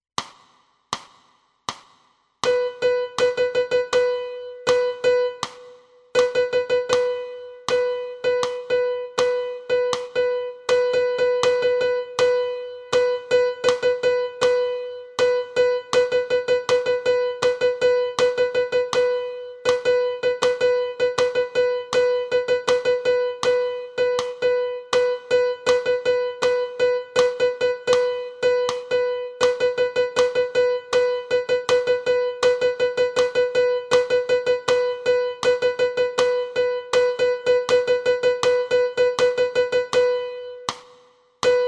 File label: piano